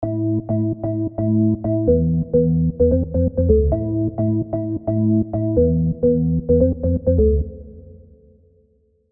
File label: M1 Organ